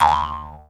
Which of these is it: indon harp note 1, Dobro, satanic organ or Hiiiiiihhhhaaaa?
indon harp note 1